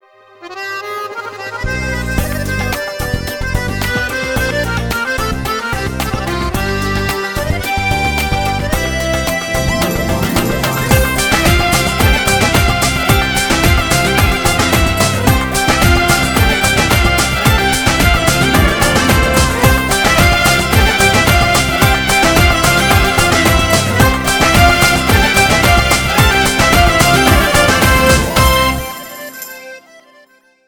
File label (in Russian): Музыка для Рекламы; Always Uzbek